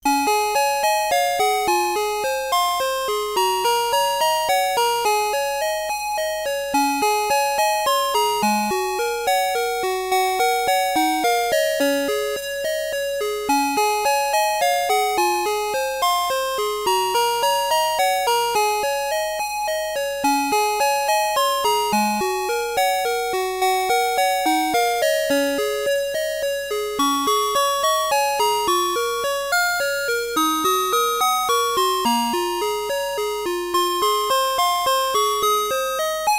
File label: 8 Chord A